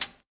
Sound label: soft sounding hip hop hi-hat one shot e key